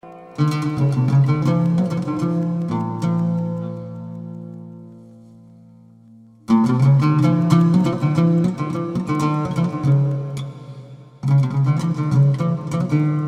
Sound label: Barbat Solo